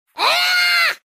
rage bee

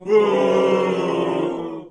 boooh

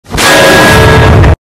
hit you with a pan

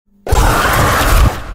jumpscare; mommy long legs

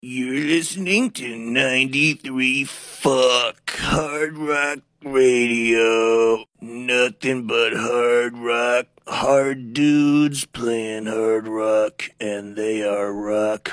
hard rock radio

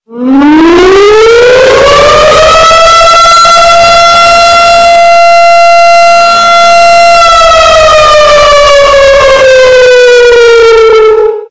tornado siren earrape

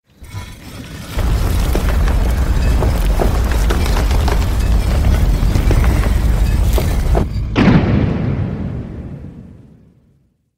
stone door

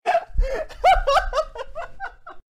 laughing

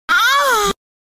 augh

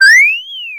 super transform; sonic

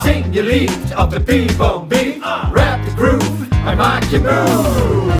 beat

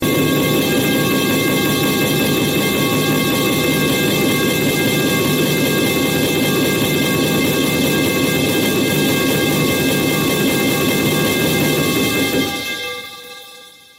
metal pipe falling spam

